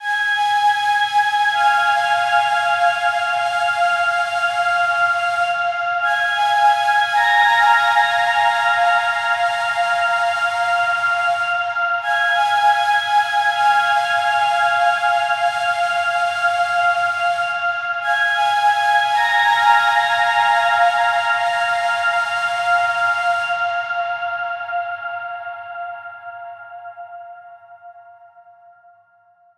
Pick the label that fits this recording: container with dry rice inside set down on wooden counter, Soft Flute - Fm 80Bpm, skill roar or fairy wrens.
Soft Flute - Fm 80Bpm